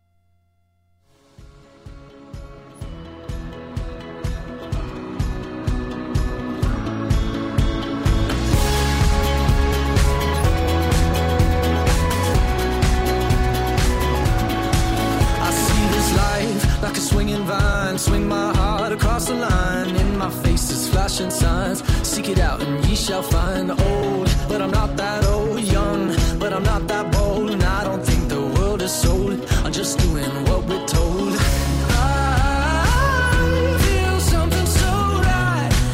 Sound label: mainstream house